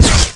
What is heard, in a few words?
Bullet Shield, Impacts